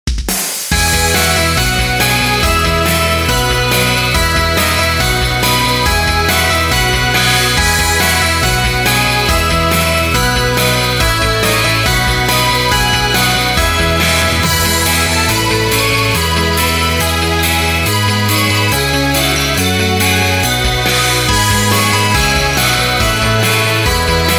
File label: chime